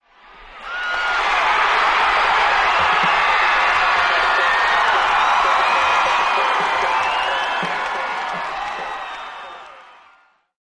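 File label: football crowd swell, cheers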